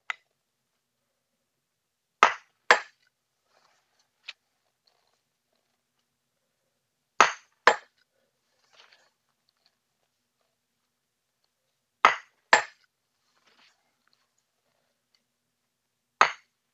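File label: Croquet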